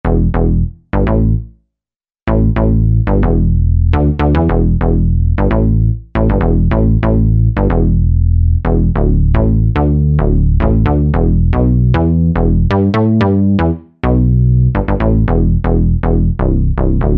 Minimoog; Sonic Reality Modern Songwriter

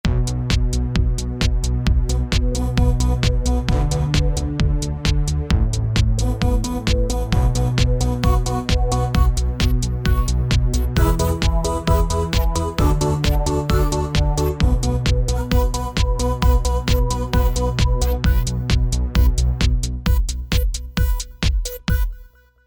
Lazer Dance, synth

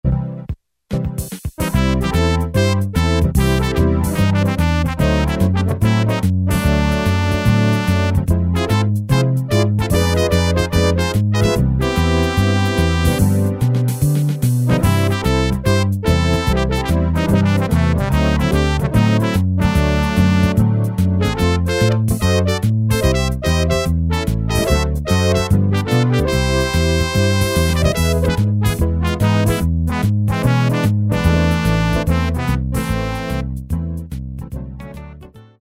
shuffle, Technics SX-K450 Automatic Rhythms

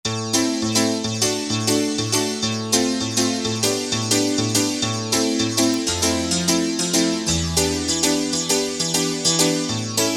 90s Pno

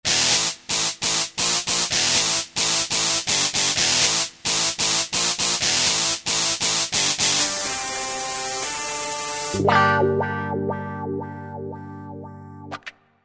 Gtr Menu; Roland SRX-05 Supreme Dance

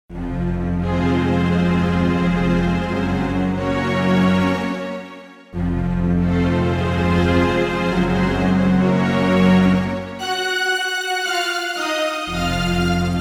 Tremolo Strng, Roland JV-880; Factory Patches

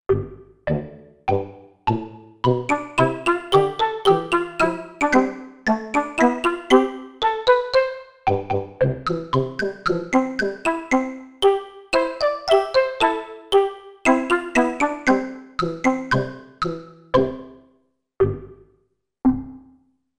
Lovely Duet, Korg MSC-1S; Korg MSC-1S Variety

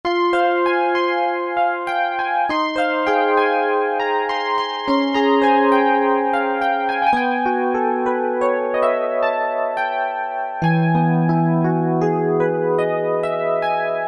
Intimate EP; Synth 3

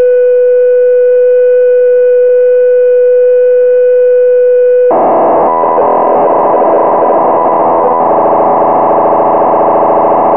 8bit 5.5kHz; factory sounds A

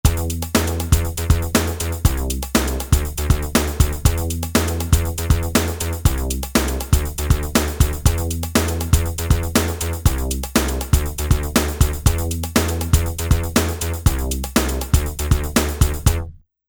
House

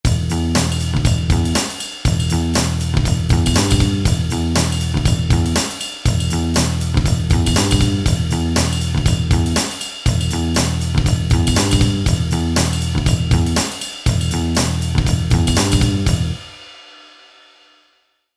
Metal

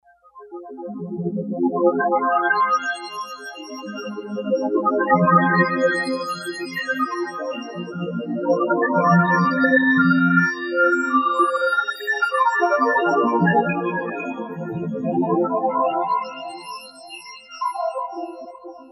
Kawai K5000S

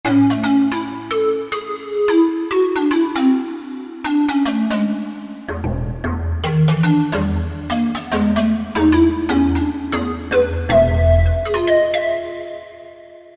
Pan Mallet, Korg M1; Factory preset examples

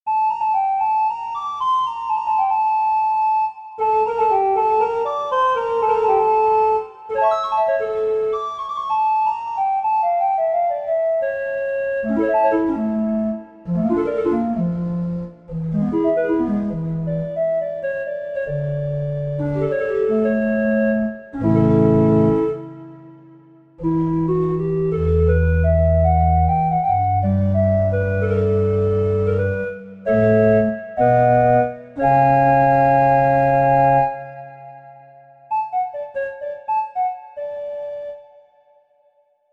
BOURDON 8, Church Organs; Universal Sound Bank Samploscopie Classic Vol. 1

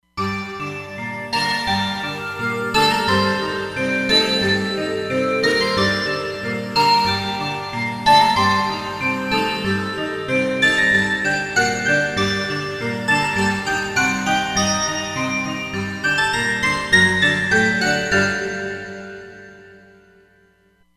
Saw Bell; Roland Fantom XR; SBF Saw Bell

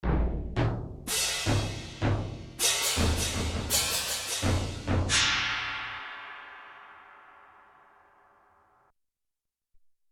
Soft-Attack, Cymbals & Bass Drum

